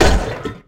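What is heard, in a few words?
metal hard, Impact; Cars; XBOX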